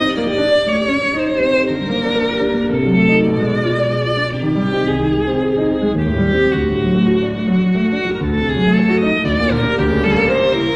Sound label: piano - Three Romances for Violin and Piano; Op 22